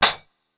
Hihat Closed

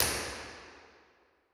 Real Places, Impulse Responses; Immacolatina BA L; Ableton Live 12 Lite; Hybrid

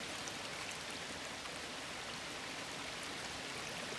water loop; background

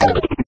undo button; uisounds; There; ThereClient

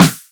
Snare; Ableton Live 12 Lite Core Library

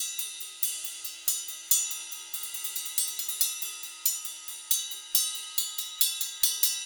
Bell Brush 70 bpm, Drums; Loops; Top; Ableton Live 12 Lite; Core Library

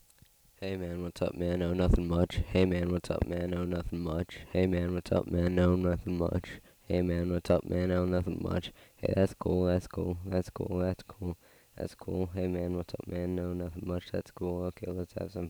crowd

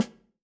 Snare Stick Hit Medium Dry Session, drums

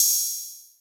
Hihat Open

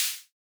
Hihat Closed Noise Long

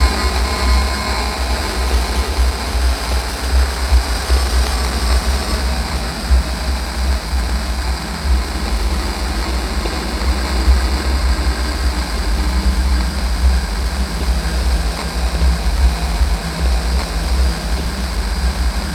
Grainy Sand; Loops; Texture; Ableton Live 12 Lite; Core Library

